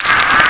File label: slave pain